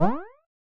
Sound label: jump